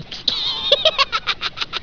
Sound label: death; kenny